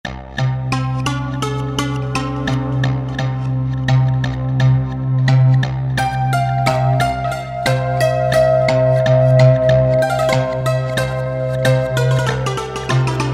Scale tuned, Roland V-Synth; Factory Patches

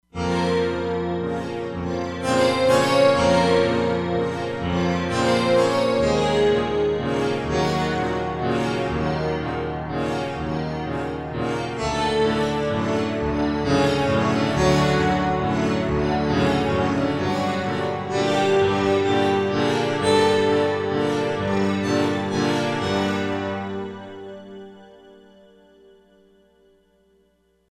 Dulcet Bow, E-mu Proteus-3; Factory patches

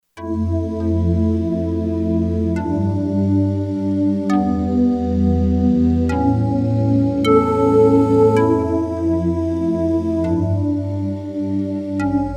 Bamboo Voices, Kurzweil K2500X; Factory Programs

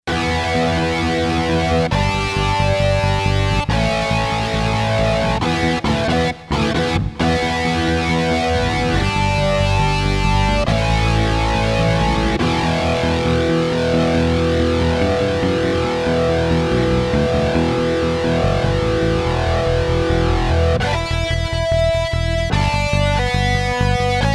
Bass-Dist.Gtr, Roland SC-880; Factory Patches

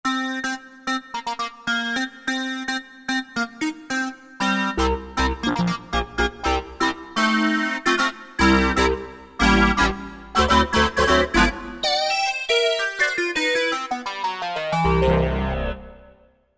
Digital Touch, Korg Wavestation A-D

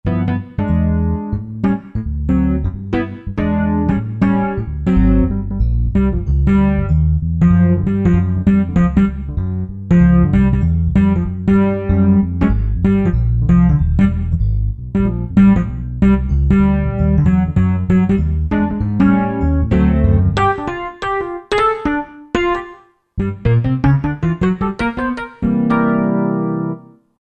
Piano; Roland SR-JV80-03 Piano; Dyno Oz

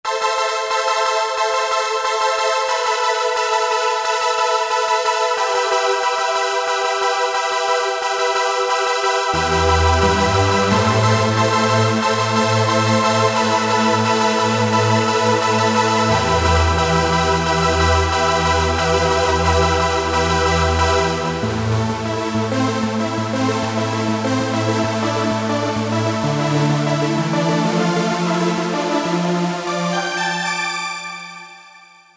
Trance Line

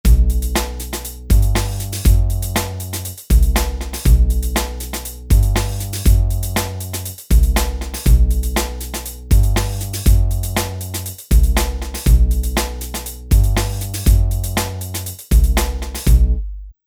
Rap Hip Hop

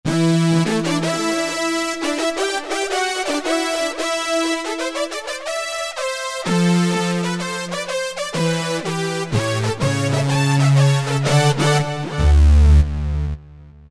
Fanfare, Roland JP-8000; Factory Performances

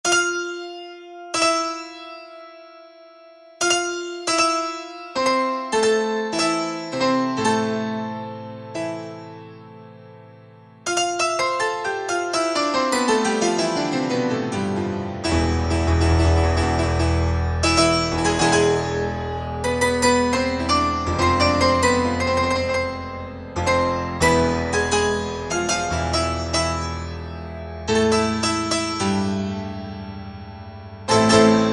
Classic Octaves; Kurzweil K2000 V3

